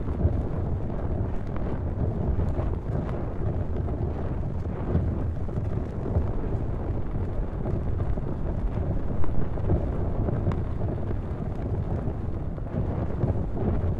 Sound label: fire